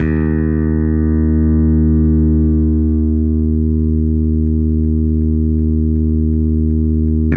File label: bass